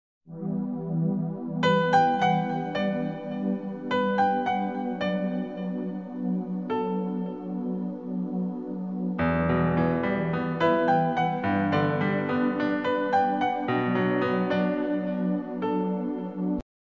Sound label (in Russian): pianino na vetru sms pianino